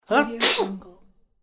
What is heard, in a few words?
funny cartoon character sneezing sound